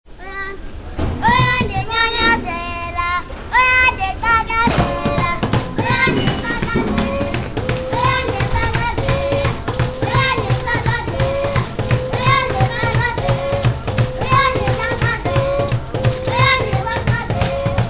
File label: african kids